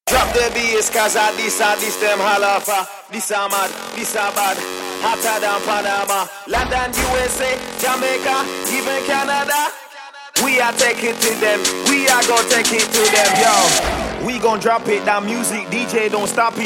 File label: Drop Bass